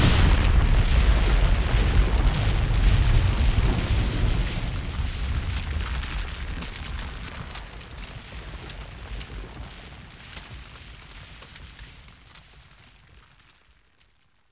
explosion; Crash Bang Boom

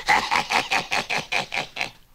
laugh demon; ooh ahh

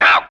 ow

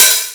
Hats Electro Open

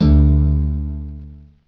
twang, Guitar; Notes

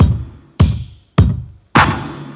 clap to the breakdown; Drum loops

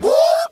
"woo"

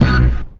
lofi

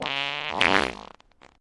fart medium two bursts; Farts

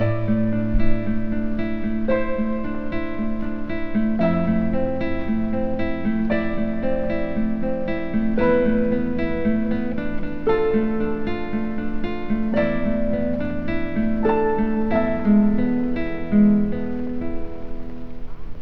guitar, Loops Sad

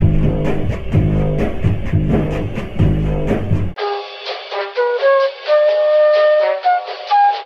flute, Loops, Jazzmatazz

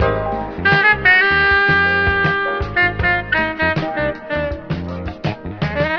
sax, Loops; Phonky

